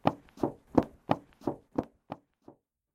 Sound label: run